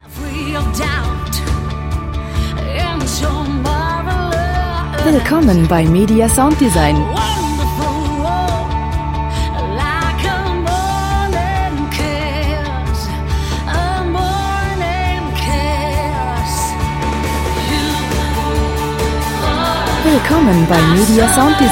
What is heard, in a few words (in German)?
gesang my summer love